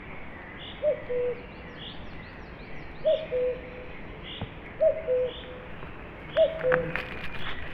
Foret coucou 3x fort; Oiseaux

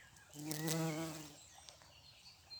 Bourdons petit délicat; Vols Bourdn abeilles

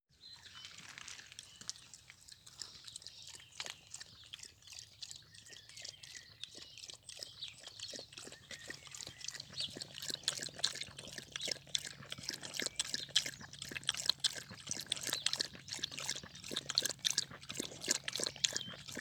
Bruitages Lappements Chien

